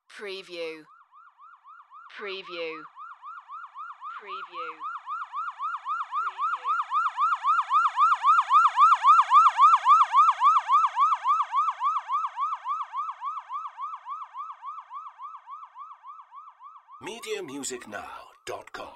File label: Fire Truck Siren Pass; Vehicle / Transport Sounds / Emergency Vehicles